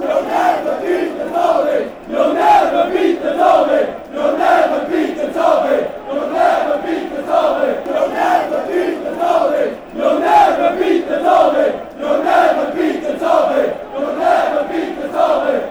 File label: youll never beat derby fanchants